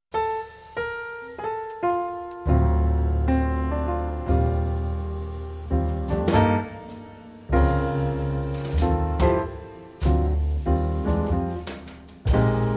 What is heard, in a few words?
Cool Vibes